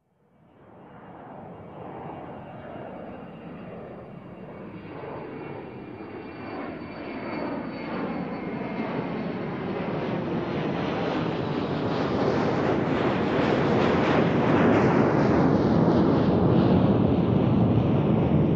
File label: Jet Aircraft taking off on airport fly over